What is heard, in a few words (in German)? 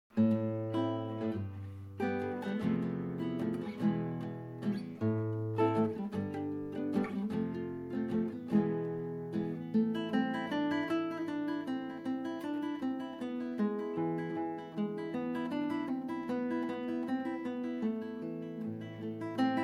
Spanischer Tanz; Spanischer Tanz Nr 1